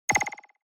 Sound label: Surprise Low Short; Onoma